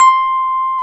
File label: piano Rhodes